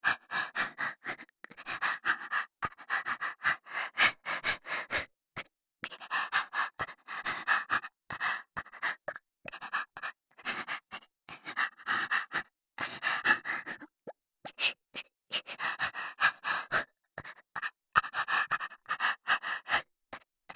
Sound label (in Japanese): 喘ぎ声; ルナ